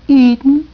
eatin; Wayzata Technology; Garden

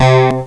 Pulsar; BabySmash 5.4

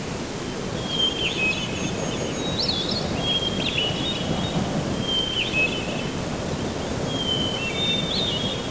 jungle; Renaissance

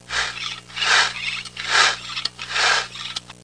HAND SAW

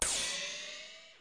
wipe; mazda; Sedan311

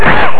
"whoa"

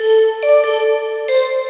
note jingle

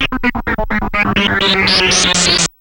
sample hold, synths, dancerap; The Sample Bank

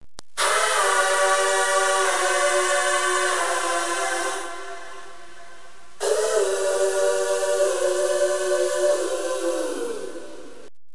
aahhhuhuhh, speech; Terra Sound Library

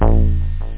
RACING SOUND; PhatTrax

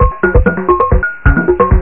Samba; Tron 2.1

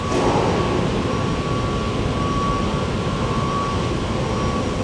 WARE AMB; Messiah; AMBIENT; INDUSTRIAL

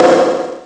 bomp; kabloey redux

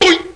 kloink; PUZMANIA

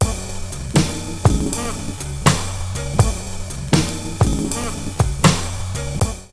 jazz; wavelab

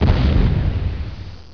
delete

